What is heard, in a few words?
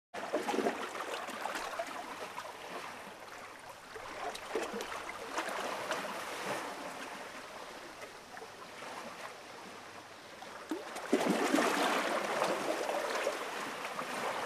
Surf, coast, light waves; sea